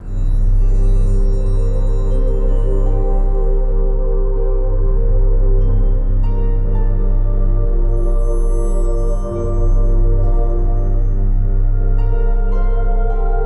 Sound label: Save Point Ambience; dust an elysian tail